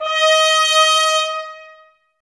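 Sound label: BRS trumpets D#; 90 s Sample CDs; Miroslav Vitous; Woodwind Brass Ensemble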